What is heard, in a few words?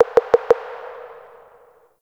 Interior Orbital Station Telemetry Mid